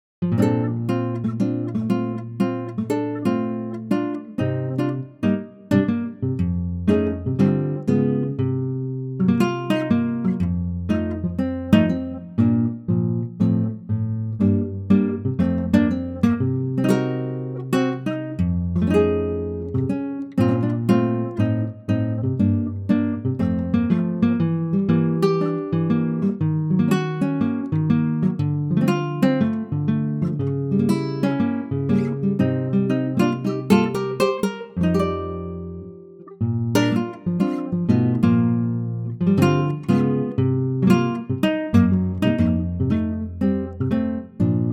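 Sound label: acoustic Guitar Bb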